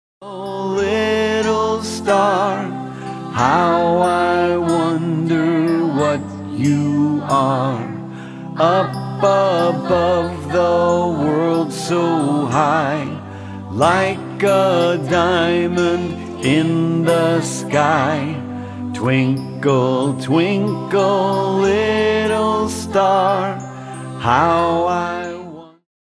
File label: twinkle twinkle little star; preschool learning fun